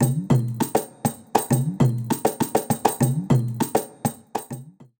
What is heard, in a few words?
kanjeera